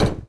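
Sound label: hit on the counter; uder do pultu; Mafie 1 cz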